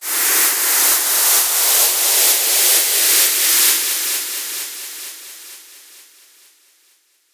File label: Noise Rain; 90’s Eurodance